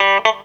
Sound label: CLAV GEL; USB Soundscan vol.32; Roots Disco; AKAI